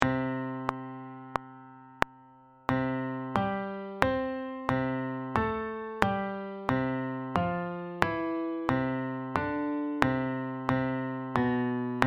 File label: pitch training slow